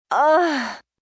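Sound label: Sex Moan Female, Sex, female, sexy moan (human voice); Groaning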